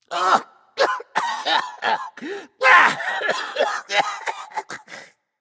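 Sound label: non-verbal clear throat; smite; voicepack; jingwei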